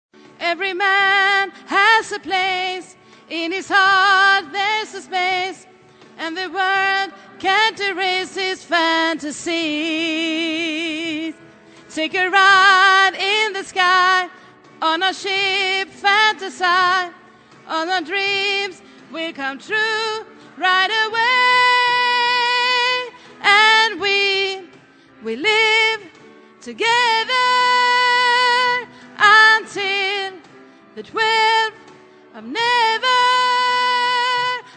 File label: tenor